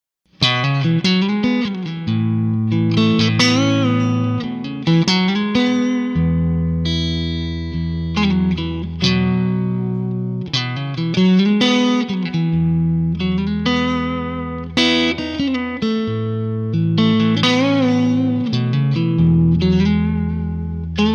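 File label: rhythm, guitars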